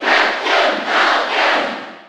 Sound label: Cheer German; Captain Falcon; SSB4